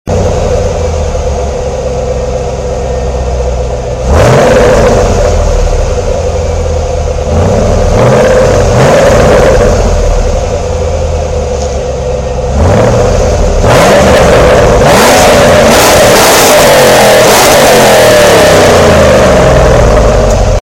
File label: Dock And Engine Noise